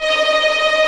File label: Vio Trem 16 D#4; Digital Sound Factory Virtuoso